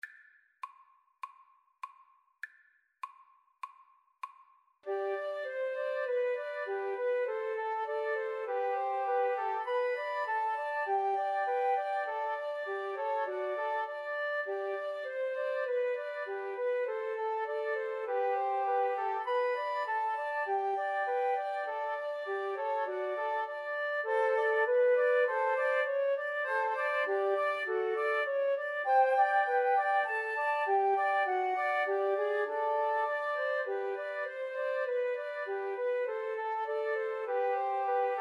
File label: Flute Trio